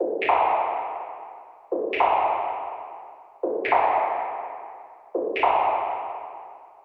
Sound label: Special Sounds 140BPM; Vengeance - Essential Clubsounds Vol.4